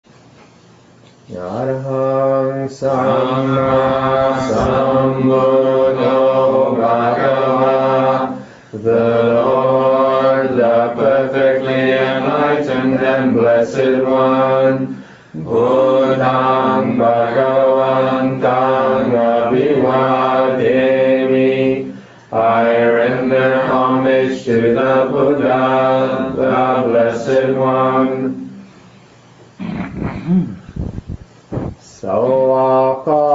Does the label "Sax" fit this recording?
No